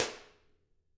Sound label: Drums, SNRR; CAMCO